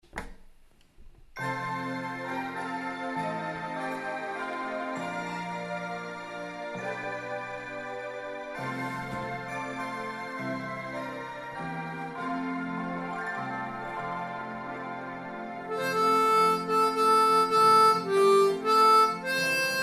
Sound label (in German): Suesser die Glocken nie klingen F, Mundharmonika